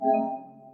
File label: popup